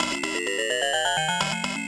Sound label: VFX2 Loop 128 BPM